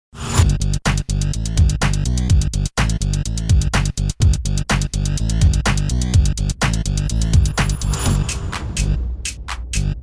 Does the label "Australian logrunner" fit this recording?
No